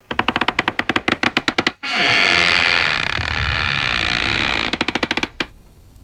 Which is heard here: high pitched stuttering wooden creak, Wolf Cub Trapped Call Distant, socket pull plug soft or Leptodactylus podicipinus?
high pitched stuttering wooden creak